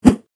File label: Battle axe swing